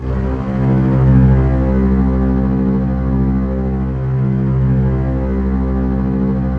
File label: C1, Synth Line; NiceBeats